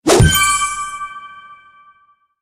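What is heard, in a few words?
angry birds highscore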